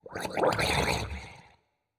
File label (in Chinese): 溺尸水中待机声